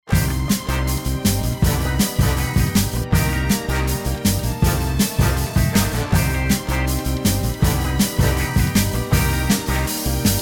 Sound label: Retro TV Theme